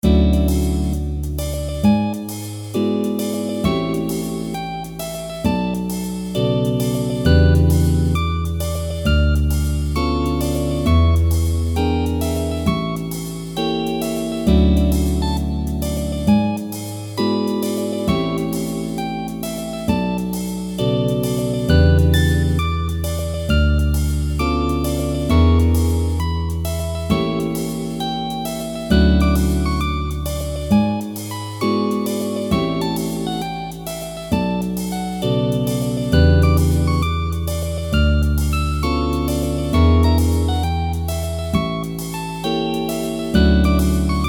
jazz

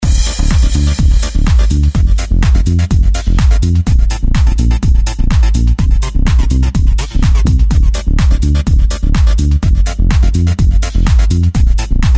Electro Loop, 125 BPM

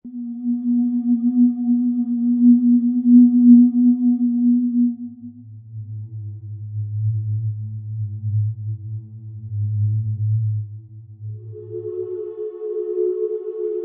deathly ambience ambient-ish remix; ambient ish stuff

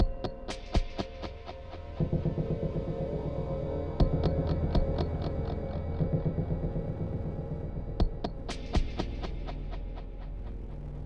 sabotage tense loop

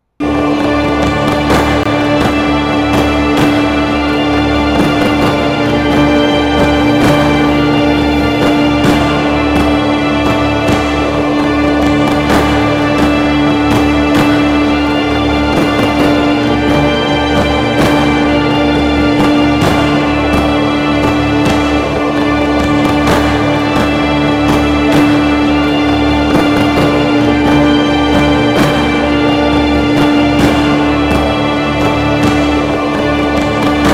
epic drums

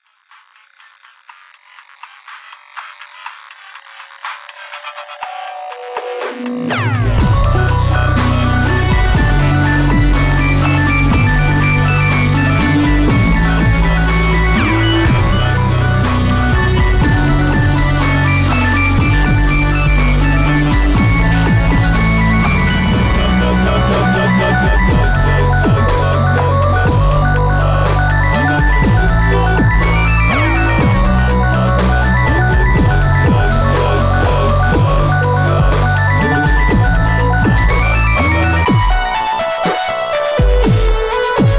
moon chime remix

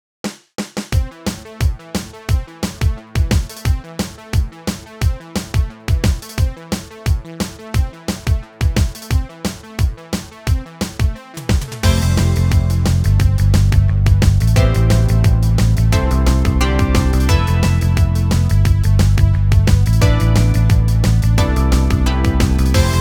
game music

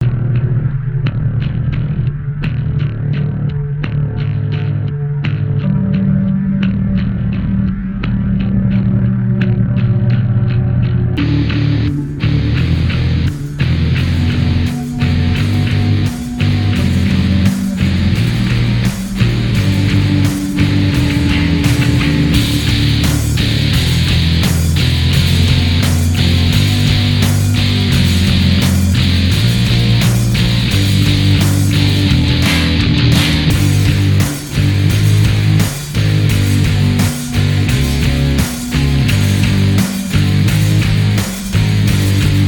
massacre metal

